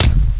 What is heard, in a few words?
left foot; hungerzork